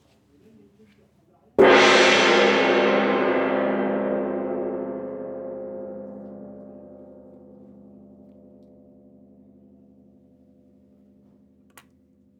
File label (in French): petit 1coup milieu haut, GONG VIBRATION